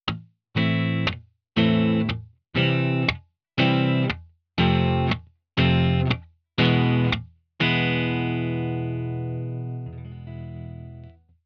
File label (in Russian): Boj s glusheniem nechetnyh dolej